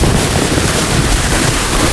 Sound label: Hardpack, surface; WhiteOut